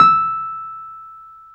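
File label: piano, Wurly, Keys of the 60s and 70s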